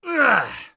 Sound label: bear2 hit; Escape from Tarkov